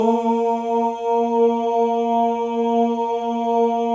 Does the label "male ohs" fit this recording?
Yes